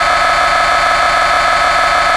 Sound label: steam